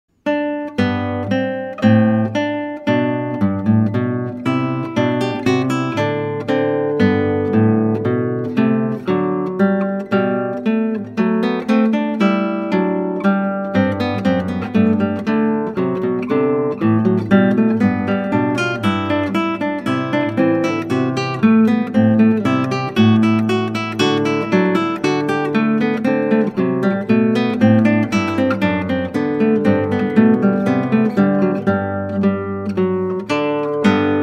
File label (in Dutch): Klassieke Gitaar; Bach 50 Solos